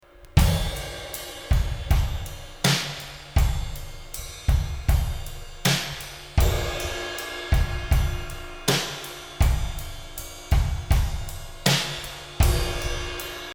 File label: drum rev eq, Low end filtered away